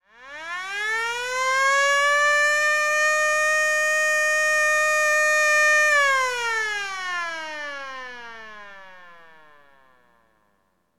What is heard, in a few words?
Alarm Sound; Motion Detection App With Alarm Image Capture